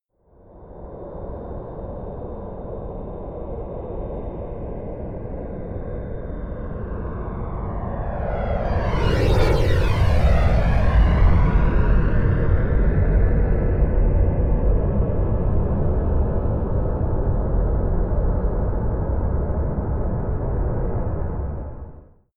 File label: plane boeing 787 flyby right to left close